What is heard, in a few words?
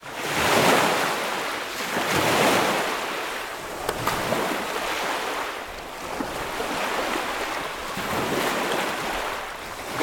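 Perito Moreno glacier Waves; Pedromorenoglacier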